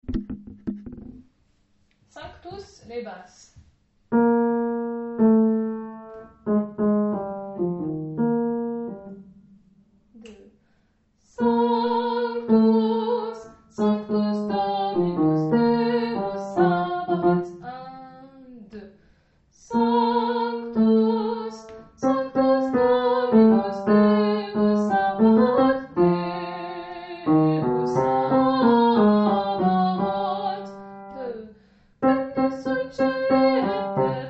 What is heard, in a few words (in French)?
Haydn sanctus basse